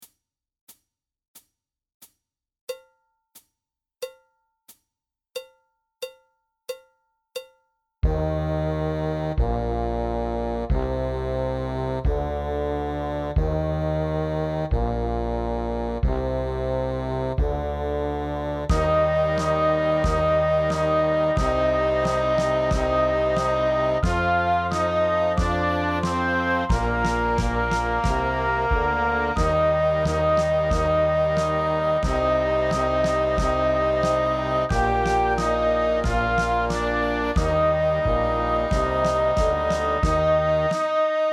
Playback langsamer

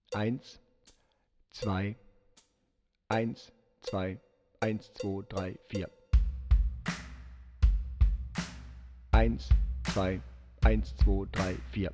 Power Rock Playback Tp Lauter

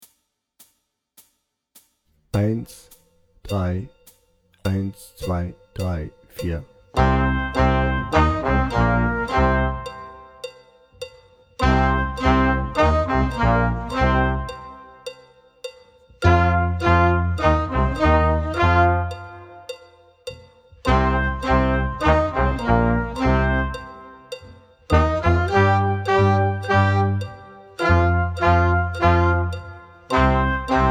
Hard Rock Blues ohne Drums langsamer

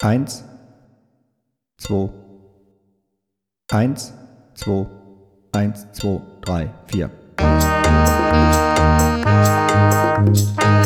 Car Sound ohne Drums

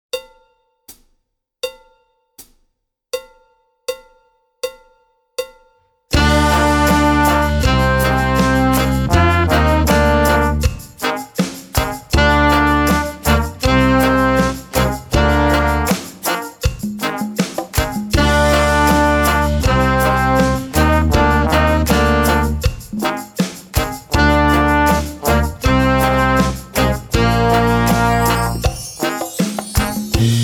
Reggae Melodie Tuba Meldodie

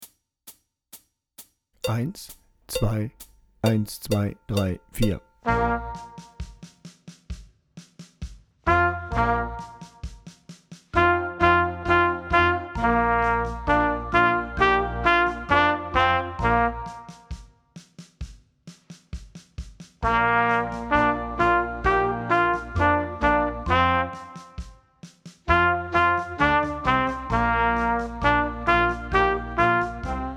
Trompete; lauter

